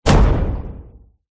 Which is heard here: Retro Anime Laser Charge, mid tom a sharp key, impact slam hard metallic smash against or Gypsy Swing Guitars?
impact slam hard metallic smash against